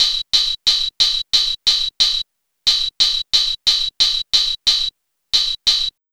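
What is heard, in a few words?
MPC hi hat, drum kit; USB Soundscan vol.03 - Pure Electro; AKAI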